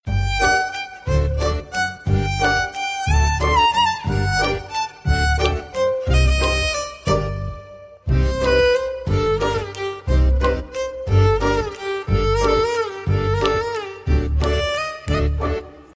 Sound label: violin tone ringtone; telugu